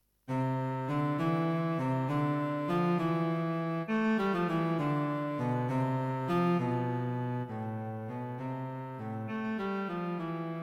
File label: Four Easy Unaccompanied Cello Solos; The audio clip is No.3